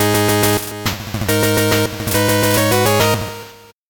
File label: 8bit jingle normal